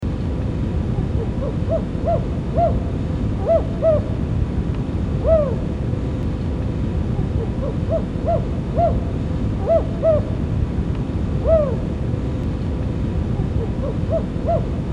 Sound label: California Spotted Owl; Monica Bond